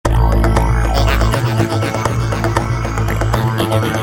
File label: Funny; Google; NewWave Labs